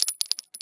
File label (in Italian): bullet cade, armi